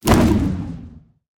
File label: basic swing; Smite; Zhong Kui